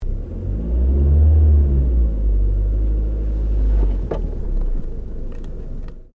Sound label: Car Ford Focus Accel Slowly Interior; Ford Focus hire car; Cars (Sound Effects:Vehicles)